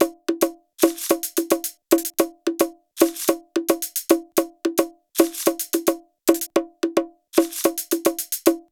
Loop 110 Hybrid Drum Nature Top Hybrid Tropical Vibe Percussion Island Future Afrobeat